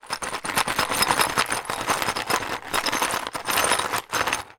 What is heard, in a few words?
foley glass broken pieces movement shake in plastic dustpan; zapsplat